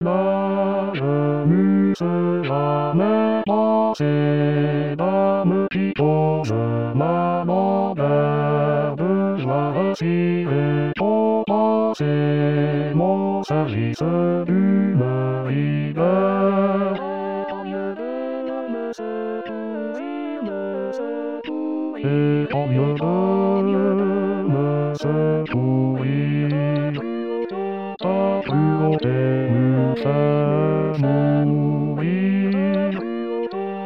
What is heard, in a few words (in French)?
las basse